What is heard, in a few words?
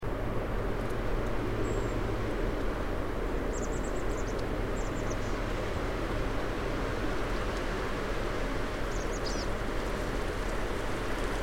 chickadees wind